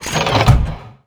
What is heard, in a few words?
polycraft arms in